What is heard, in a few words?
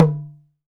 HEADED LO, MALAYSIAN DR; AKAI S-Series CD-ROM Sound Library VOL-5